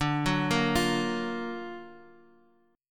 F sharp Augmented Major 7th D, chord

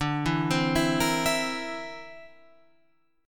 D 7th Suspended 2nd Sharp 5th D, Guitar Open F; D7sus2#5 arpeggiated

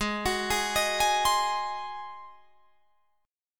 G sharp 7th G sharp, Guitar Drop D

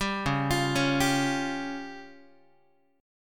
C sharp Major Flat 5th, Guitar; C#Mb5 arpeggiated; JGuitar

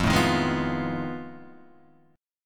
F 7th Sharp 9th Flat 5th, Guitar, Open D

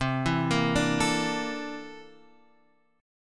C 13th C down Guitar Open F; C13 arpeggiated

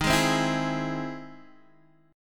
E Augmented Major 7th D sharp

